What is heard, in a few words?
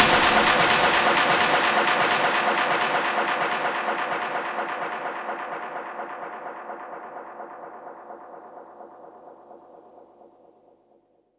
Impacts